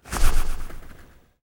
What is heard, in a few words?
bumerang